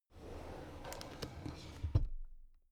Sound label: drawer cabinet crammed close soft halfway